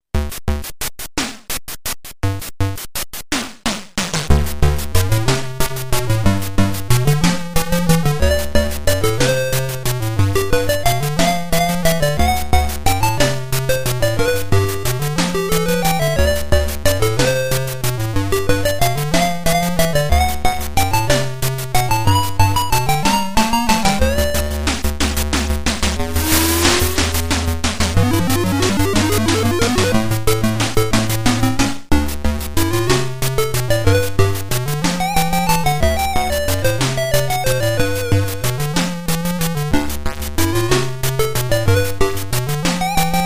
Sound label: shuffle; cafe